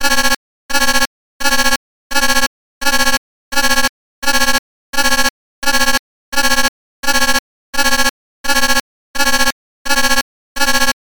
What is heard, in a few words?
science fiction console alarm; sound effects one